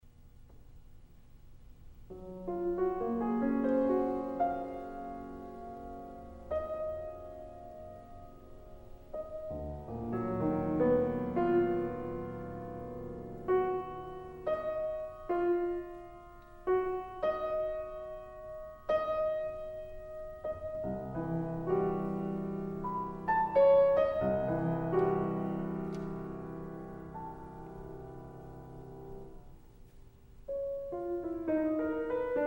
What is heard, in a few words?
piano prelude